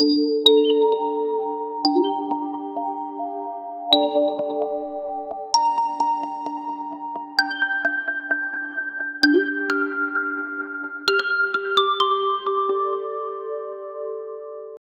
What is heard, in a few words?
a deep house composition, birds singing